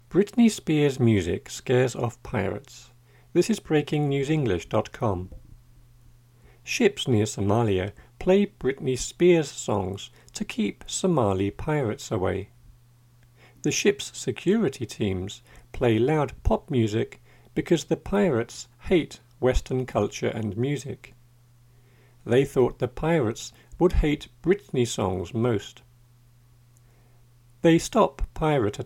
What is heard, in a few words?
loud music